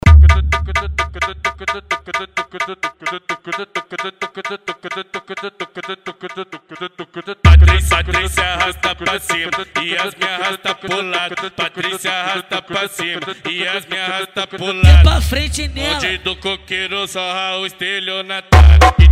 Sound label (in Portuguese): funk grave